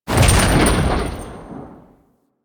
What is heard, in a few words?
Ghost Teleport In; Warframe; Priest Quest; Rell Ghost